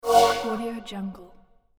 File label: cool ambient ethereal video game menu sound